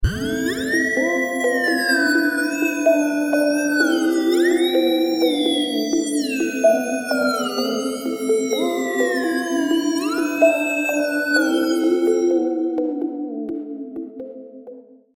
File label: Theremin